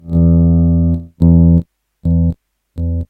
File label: Nova System Exp Pedal Noise